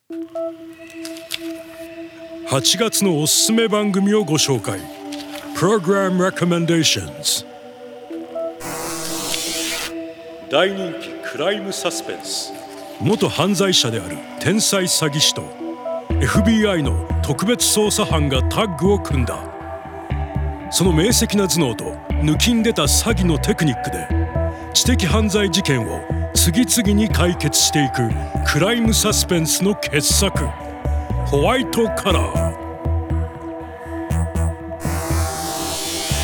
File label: Drama serious